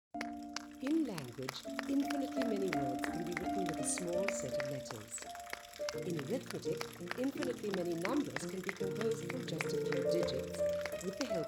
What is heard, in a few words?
soundscape Rev ambi2bin eqs